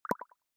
tennis

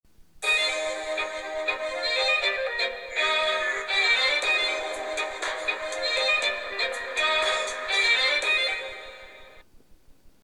bbgrooves interlude, blackberry bold 9700

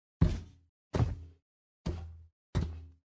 walk

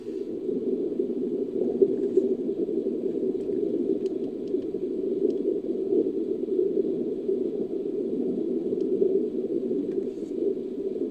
dugong

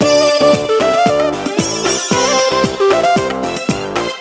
Bollywood; amazon fire hd 8

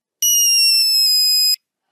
rocket, nokia 3310; zvuk nokia 3310

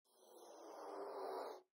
heart message; Системные звуки и уведомления iOS 18 (iPhone 16, 16pro, iPad)

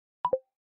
lock

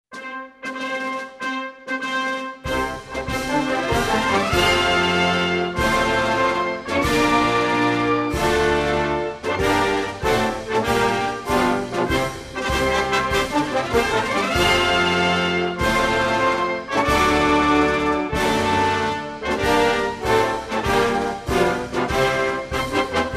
anthem

